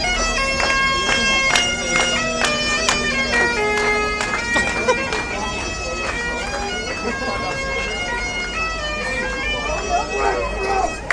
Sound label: celebratory piper outside; the hawthorns